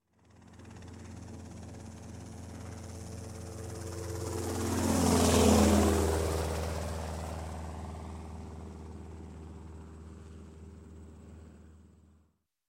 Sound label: Helicopter Robinson pass By, Fast; Sound Ideas 2000 Series